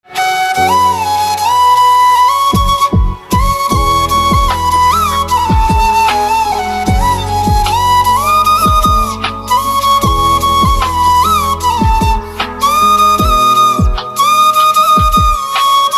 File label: Flute